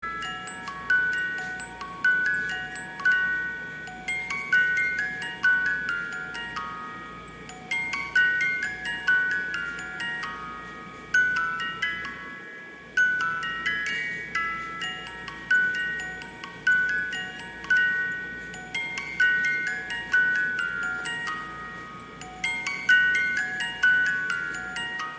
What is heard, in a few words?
music box